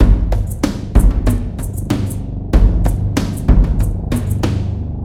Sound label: sounds music; Halo Combat Evolved; Xbox